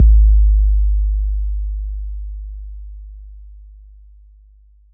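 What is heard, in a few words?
bass drum 808 Tube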